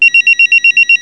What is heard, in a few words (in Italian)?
squillo